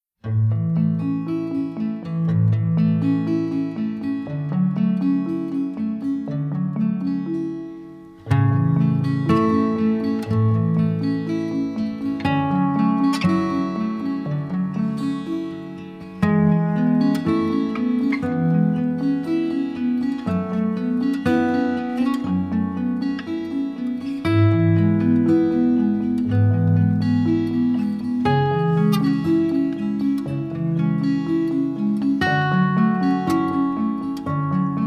Luau Lullaby Instrumental

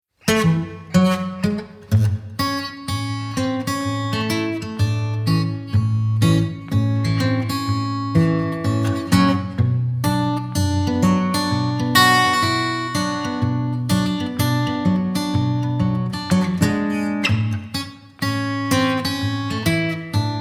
Green Guitar Instrumental

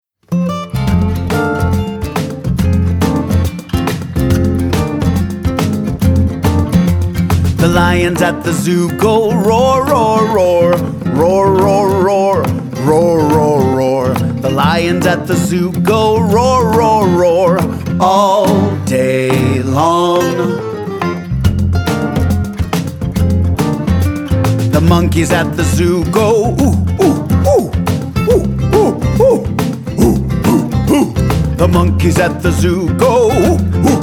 Go Roar Roar Roar

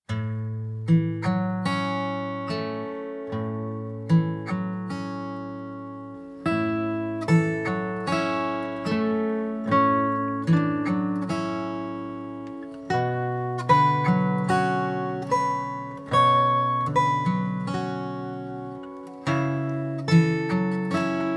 Rocking Boat Instrumental

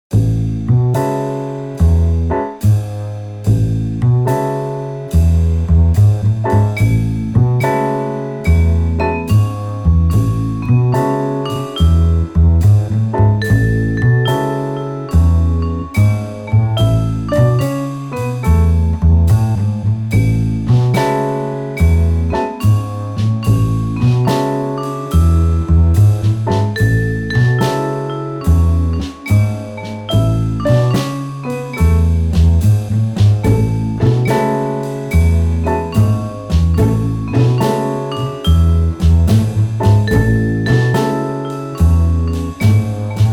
Row Your Boat Instrumental

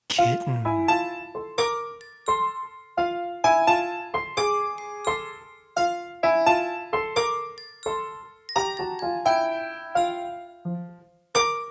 Baby Animals Dance Instrumental

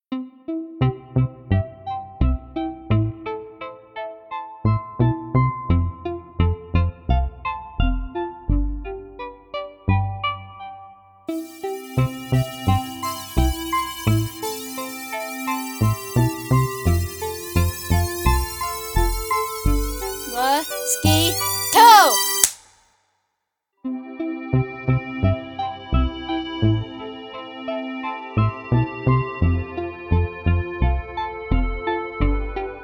Mosquito Buzz Instrumental

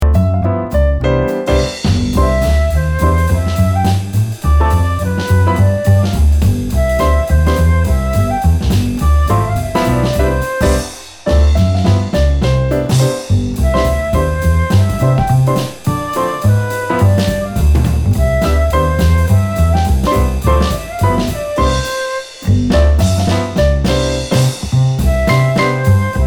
Skip to My Lou instrumental

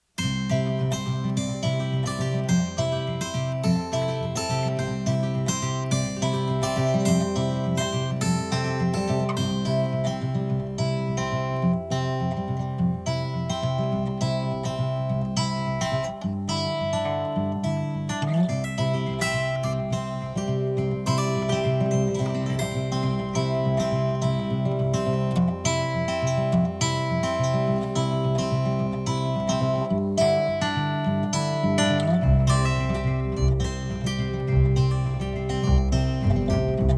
American Folktale Instrumental

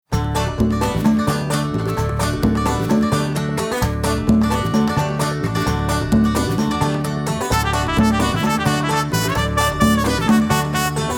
Bedal Your Bike Instrumental

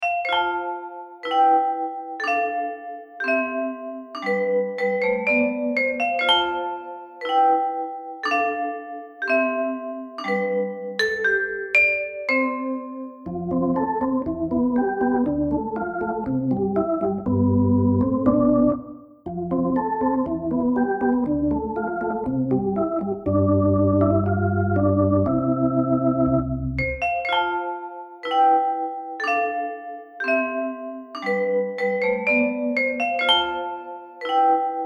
Black and White Song Instrumental

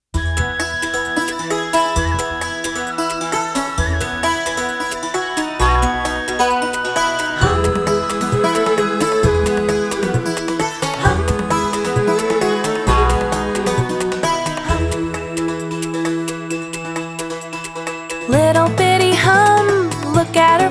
Hum The Hummingbird

